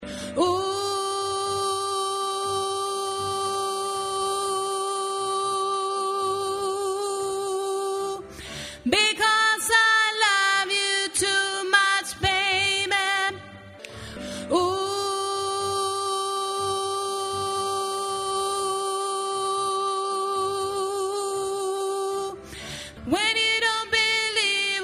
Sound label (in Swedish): sopran